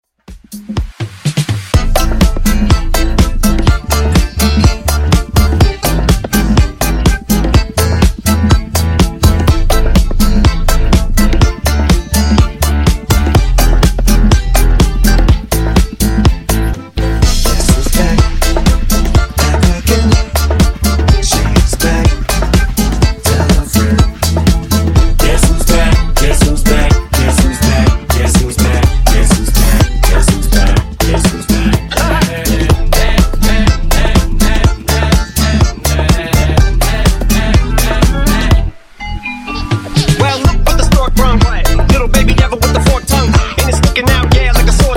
Funk Vocal Edit Clean 124Bpm

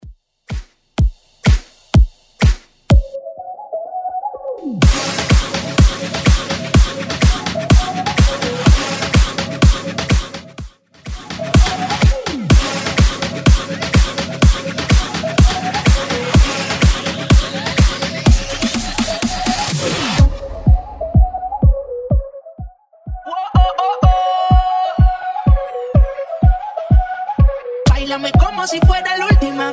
Uptempo Bootleg 125bpm

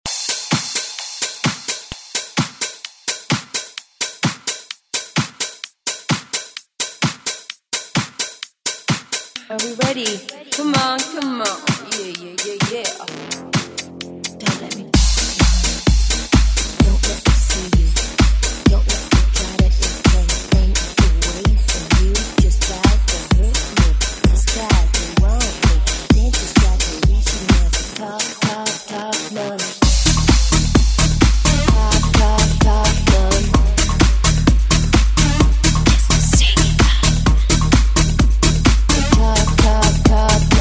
90s Club ReWork

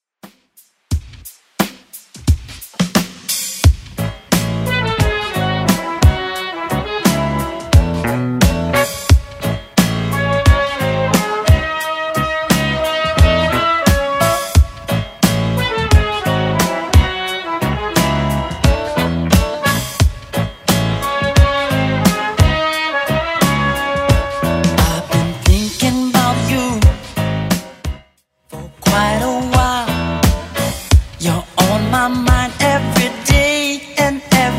80s 88bpm; Redrum

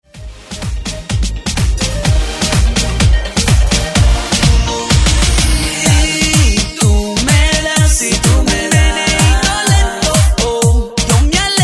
Remix ReDrum 126 Bpm

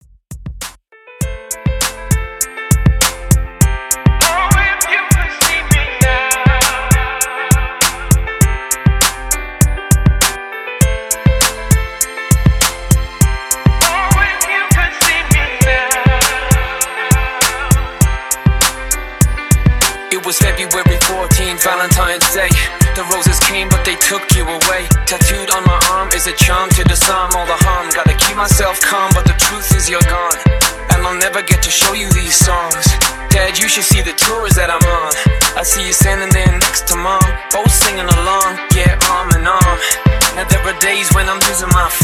Alternative Pop Rock Re Drum 100 BPM

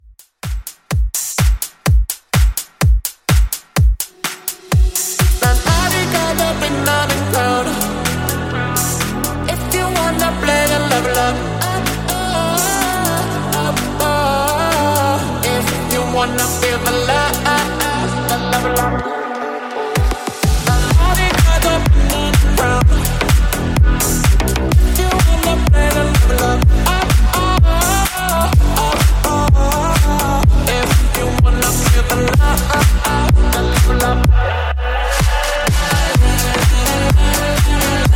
Club House Mix 126Bpm

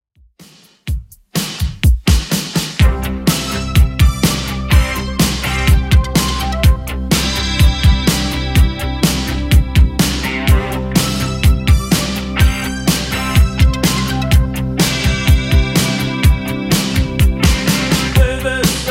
80s Redrum 125bpm

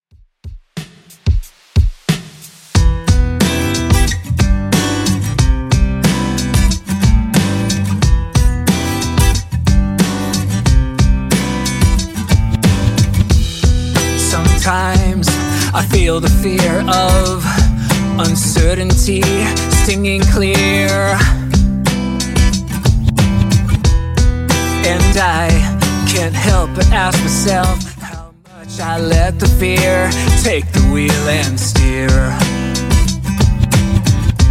2ks Alternative Redrum 91bpm

